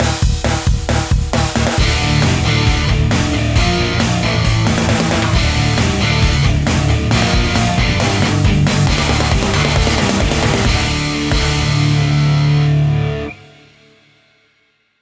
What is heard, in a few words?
Game Mode Win; Garfield dmx minigame3